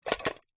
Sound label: leisure chess game set box lid open movement